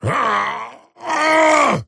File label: attack effort large; axe